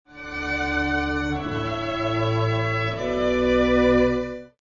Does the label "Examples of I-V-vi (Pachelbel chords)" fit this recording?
Yes